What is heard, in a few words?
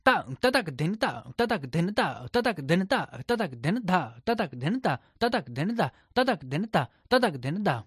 India Vocals